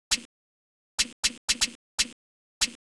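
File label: Side HH, hi hat; hydrogen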